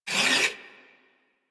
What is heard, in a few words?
Moa Leg Movement; Enemies; Warframe